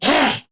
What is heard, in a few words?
Melee Attack Grineer Ghoul Sargeant; Grineer Ghoul Drill Sargeant; Battle Barks